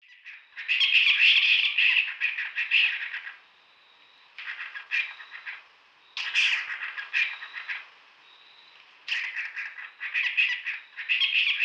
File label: Spotted Owlet, bird call